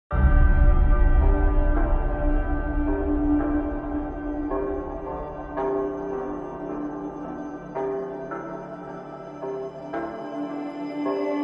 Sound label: Ambience Mix; TYPE-MOON Music Collection; Fate Apocrypha; Fate Apocrypha Original Soundtrack I